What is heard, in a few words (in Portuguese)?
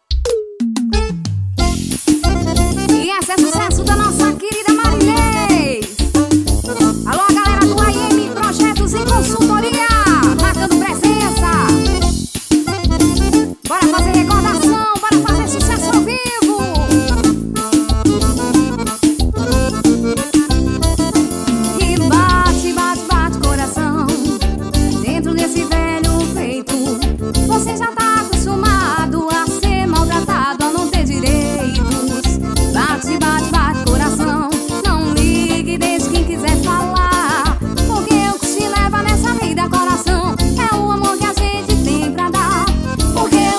forró